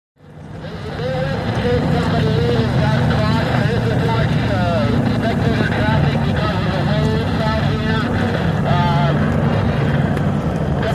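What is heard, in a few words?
Power Boat Race Ambience, off shore power boat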